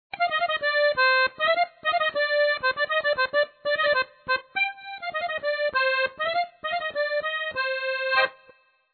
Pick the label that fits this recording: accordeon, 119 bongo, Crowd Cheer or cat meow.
accordeon